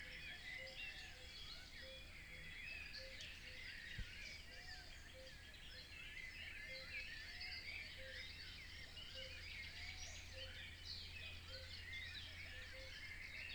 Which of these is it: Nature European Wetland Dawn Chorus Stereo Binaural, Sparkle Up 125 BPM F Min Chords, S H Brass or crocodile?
Nature European Wetland Dawn Chorus Stereo Binaural